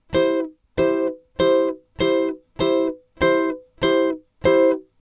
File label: Jazz